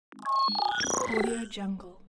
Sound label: futuristic sound